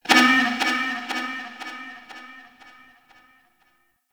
Ship Energy Bounce; Warframe; Corpus Ship Remaster

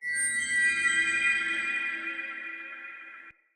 Day Texture, Ambience

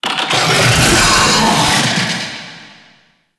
Summon Vocal Verb; Warframe; Uriel; Catenach

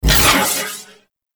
Proj Fully Charged Whoosh; Weapons; Warframe; TheNewWar; Omicrus

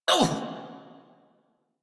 Pain, en; Warframe; Corpus Aristocrat Manager; Treasurer; Battle Barks

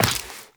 Step Flesh, Footsteps, Walk; Warframe; Tenno

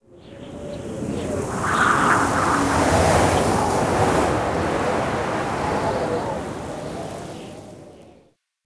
Exterior Wind Gust; Duviri Murmur

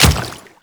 Water Step; Warframe; Grineer; Royal Guard; Footsteps

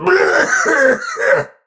Pain Heavy; Tau; Dax; Battle Barks

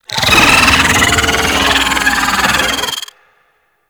Pet Vocal Death; Warframe; Alad V Pet

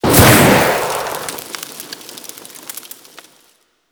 Fire Damage Hit; Warframe

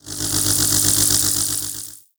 Magnetized Random; Warframe

